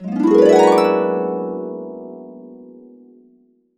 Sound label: Complete Harps; USB Soundscan vol.62; AKAI